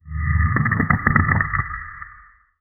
sonido fx estilo volcanic

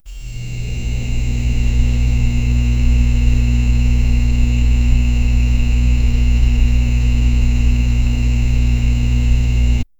poder de luz, scifi

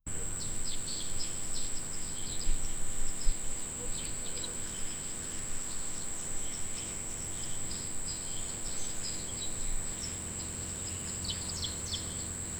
ambiente sonoro misterios, mountain

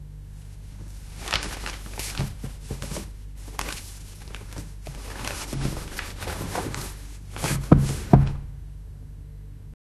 un hombre joven moviendos, bed

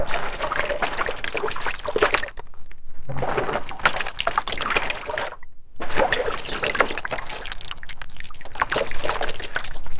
objeto arrastrandose en, submerged

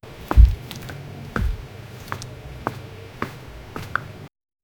goblin andando chaf chaf

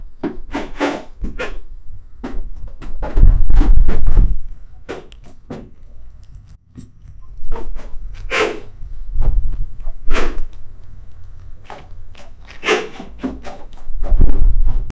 llorando y pateand, anger

